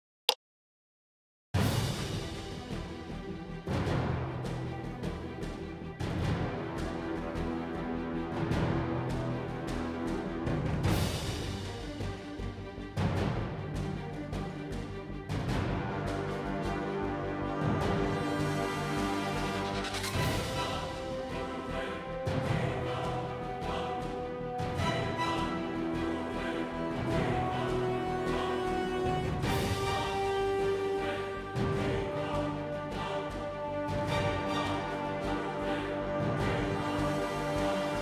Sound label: base Game Sound; Ingenuity Gaming; medusas Golden Gaze